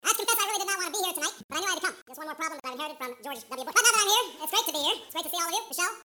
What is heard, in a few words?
highPitch; magnitude